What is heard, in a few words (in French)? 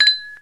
violon principal